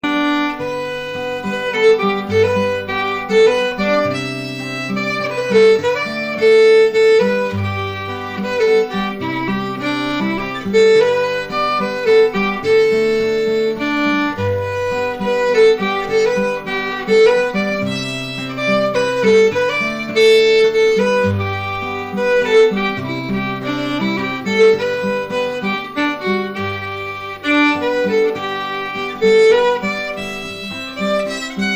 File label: Waltz